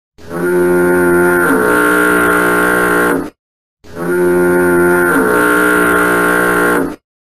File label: Foghorn; Spongebob